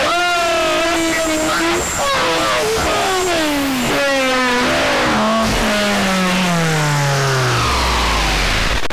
Breakbeat Owwwwwwwch

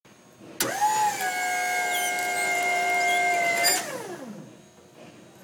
Industrial Equipment Noise

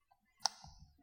Iphone Button Wake Up

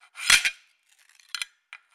Wolftrap

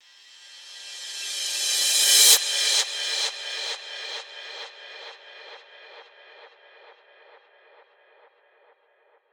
Reverse Crash Cymbal Transition

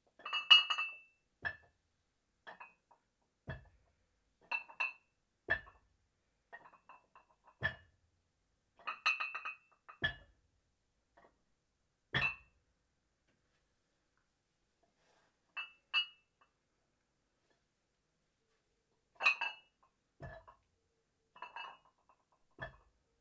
Metal Weights

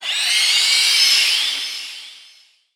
Horror Screechy Scream

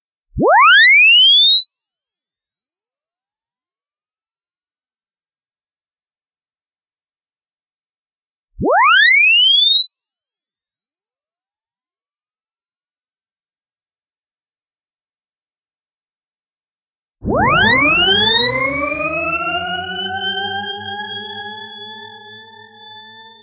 Charging Flash

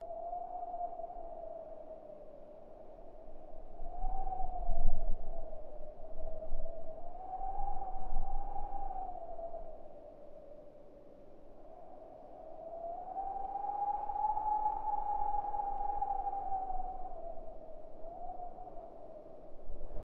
Awesome Winter Wind

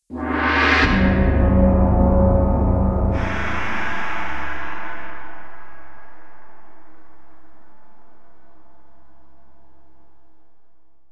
Breathing Gong Of Sword

